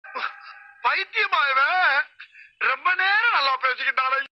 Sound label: message tone; vadivelu